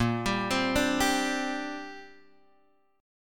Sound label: A sharp 6th Add 9th, Guitar, Open G; x,3,5,5,3,5; down